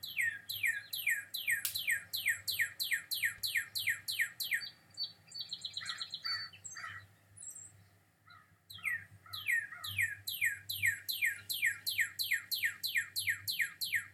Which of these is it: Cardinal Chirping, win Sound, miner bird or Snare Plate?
Cardinal Chirping